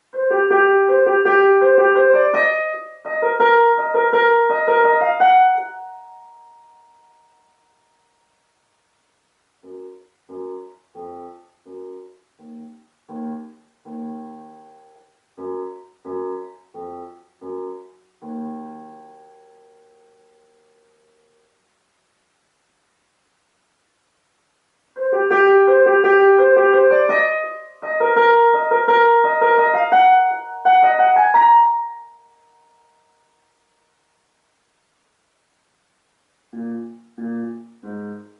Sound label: electr piano